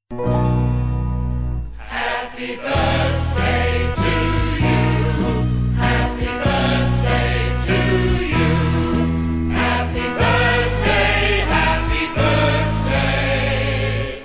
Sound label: happy birthday to you song